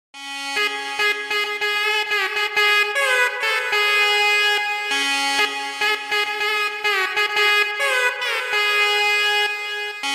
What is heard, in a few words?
nadaswaram